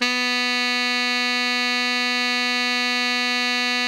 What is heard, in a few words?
TENOR FF B3, Saxophones; Greytsounds; Philip Wolfe David Crozier's Saxophones; TENOR SWITCH